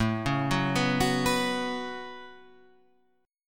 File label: Db 7th Suspended 4th Sharp 5th